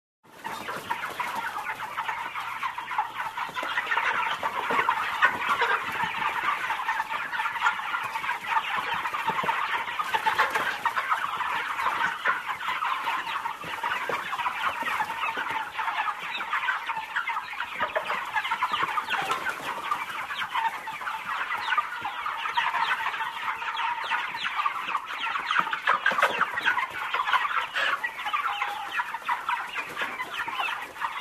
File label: Chicken Poultry